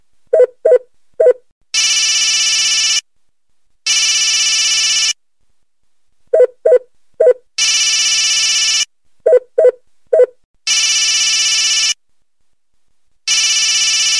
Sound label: classic phone ring